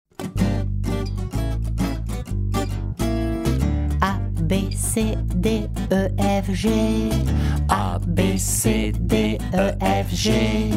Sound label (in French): CHANSON alphabet francais